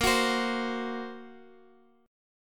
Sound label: A sharp Suspended 2nd Sharp 5th, down, Guitar, Standard; strummed; A#sus2#5 chord diagram; JGuitar